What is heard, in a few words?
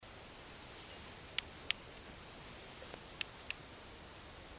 Tea tree Firetails with female clap